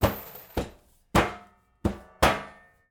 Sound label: Metal Can Drum Loop; Nova Sound